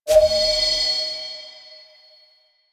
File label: Courier Pickup; Warframe; Duviri; Courier Delivery